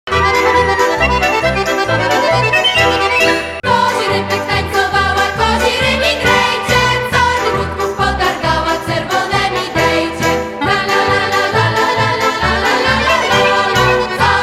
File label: Circle Polka, Folk Dance Music